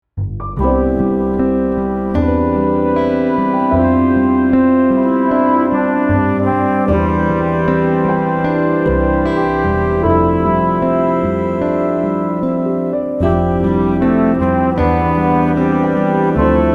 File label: chillin on horns